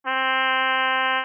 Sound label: trumpet